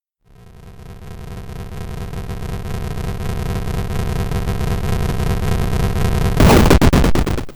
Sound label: chandelierdrop